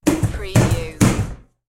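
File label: Trash Can Knock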